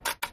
Single Camera Clicks

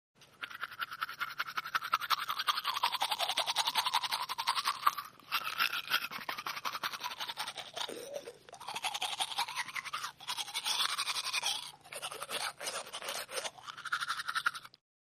Brushing Teeth: Heavy, With Mouth Open.

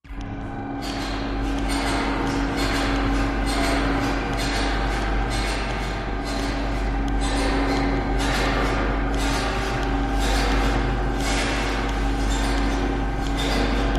Drain Pipe Cleaner Machine; machines winches

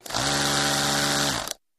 Motor, Small; Vibrating, Slow Speed

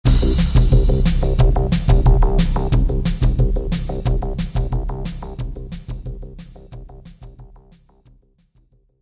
Music Logo Sports, music sequence

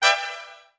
Music Effect; Various Trumpet Jazz Hits.

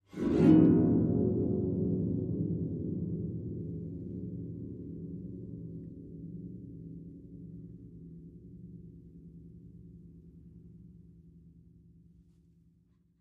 Harp Fst Shrt Gliss 1; strings harp

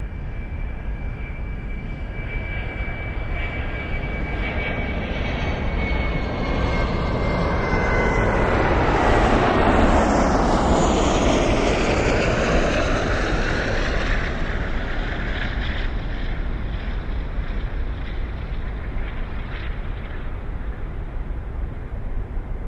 Airport Jet Fly Over; land & takeoff

